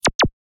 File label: bip bop